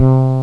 Fretless Bass (C2); X-Wing; X-Wing Patches

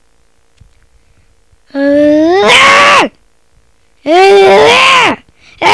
roar

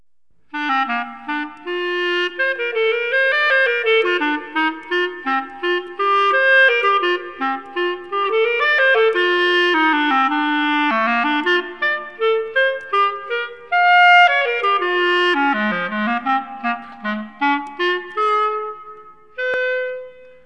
clarinet

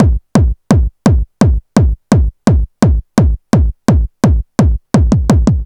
dr kick; Techno Maker SPCS

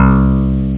Flick Bass (soft); OctaMED

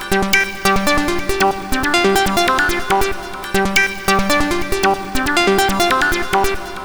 Air, Techno Melody; TechnoMusicMaker

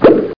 Door pop; How Many Bugs in a Box

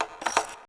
WRONG KEY; thief

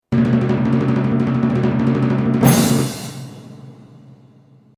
jingle; MOBICLIC161

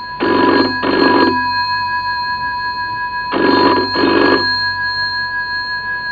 ring in; BigMax nº 7

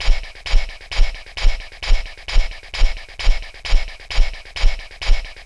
Train; Mr. Wonder's Greatest Toyshop on Earth; OmniMedia

